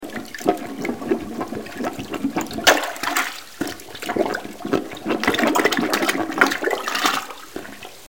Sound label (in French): Evier